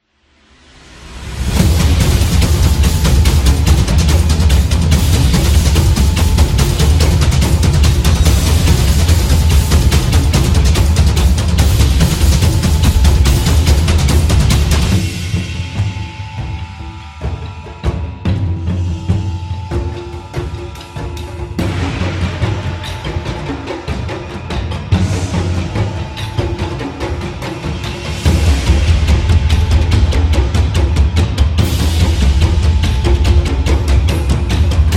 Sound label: Taiko Drums